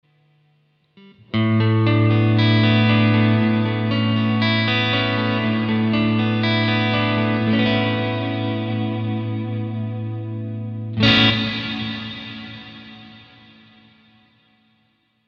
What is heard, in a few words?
Amp A Reverb Ether max mix; Simlpifier